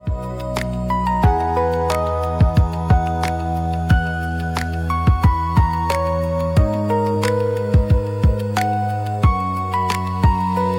gentle morning, puzzle flow

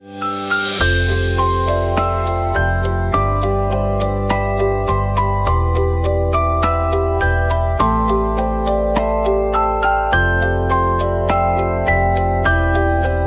bamboo grove, Calm Ambient Music

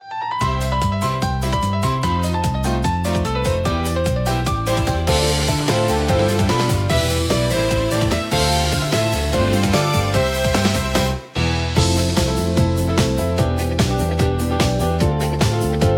business pulse

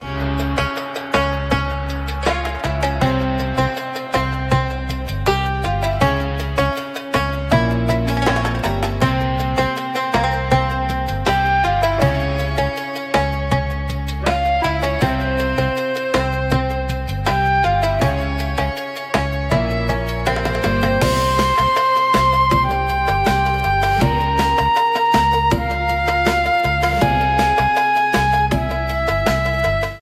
arabian bazaar, Middle Eastern & Desert Music; eastern life

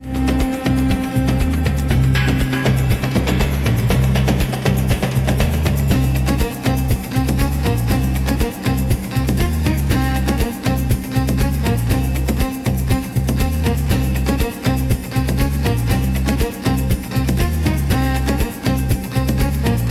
mysterious city

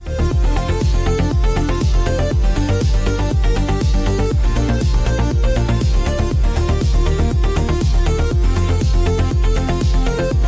space adventure

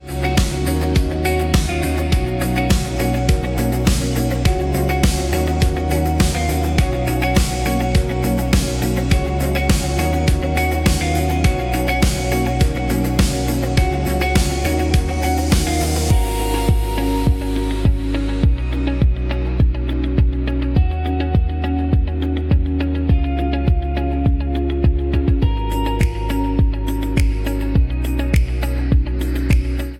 industrial dawn, music

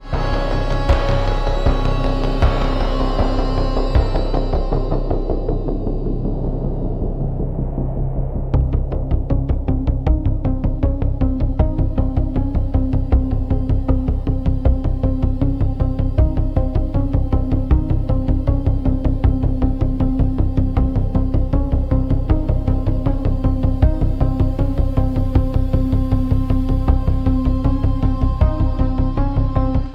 scary space

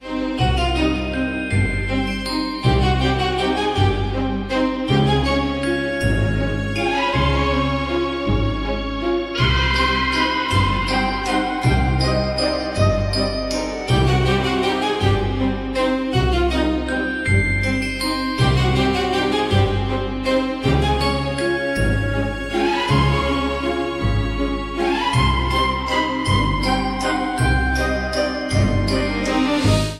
old school horror

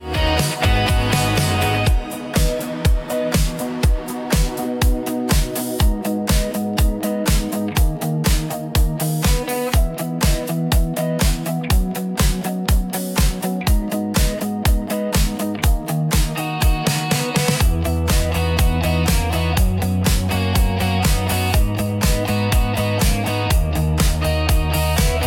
corner shop beat, business music; business music pack

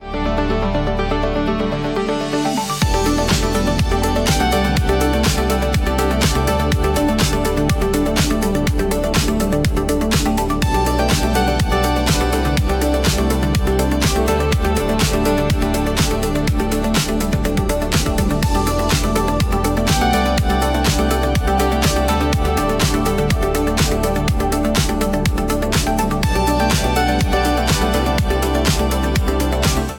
pulse of the skies

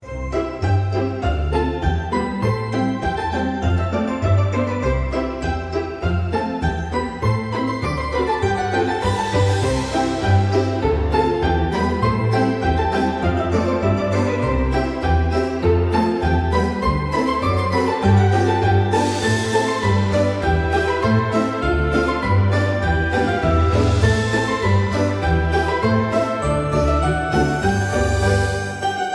playing snowballs, winter tale

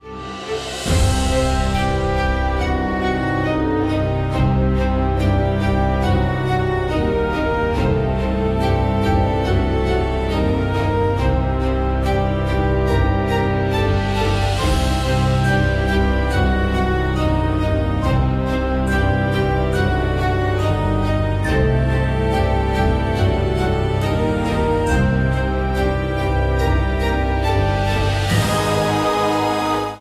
classical horror

